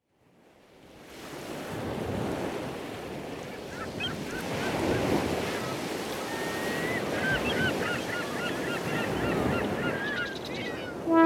ARP French Horn

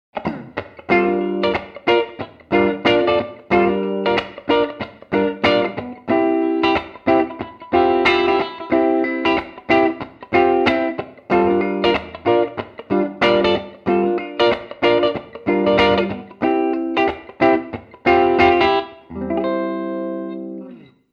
Clean

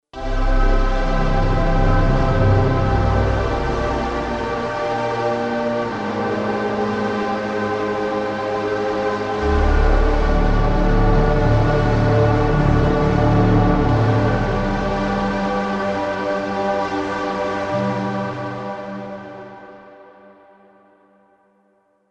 Pad Cosmic Storm; Elysion 2 Antares

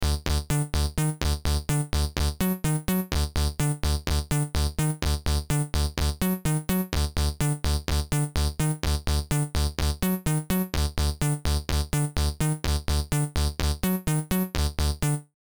Stylophone Sampler Seq Round Robin

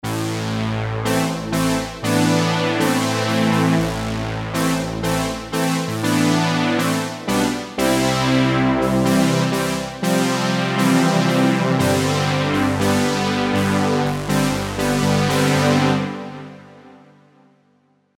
EZkeys 2 Synthwave; Nova

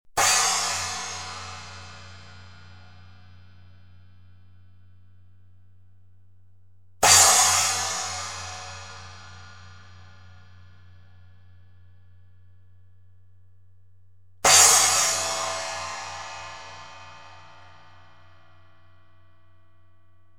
Cym

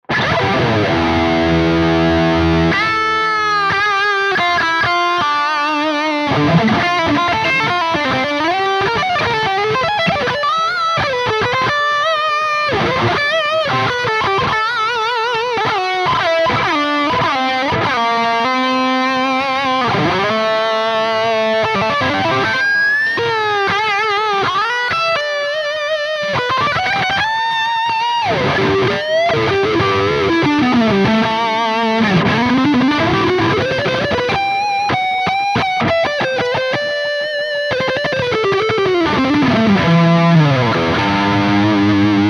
Ibanez QX54QM SLO Highgain Lead

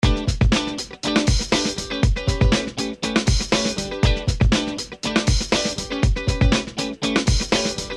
guitar strat

